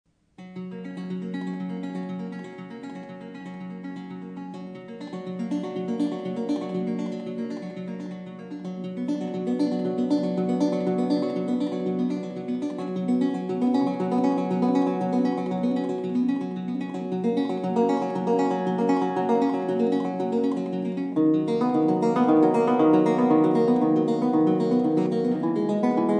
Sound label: toccata arpegiata; Kapsber